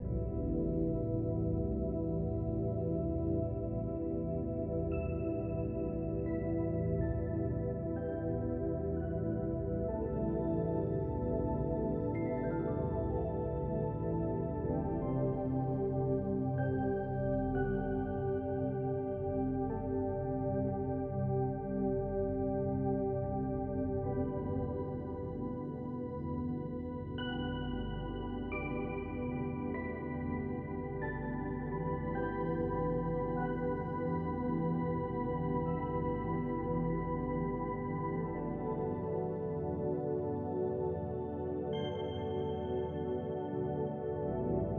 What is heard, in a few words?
relax beat